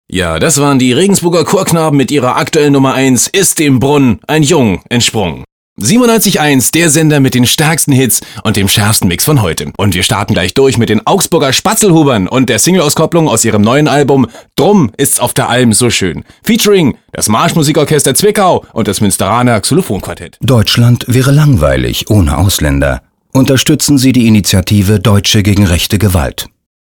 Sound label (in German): hausmusik